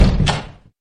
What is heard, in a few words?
Open Door